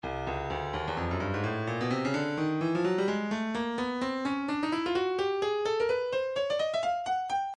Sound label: breakbeat midi files 125 129 bpm 128; Stylus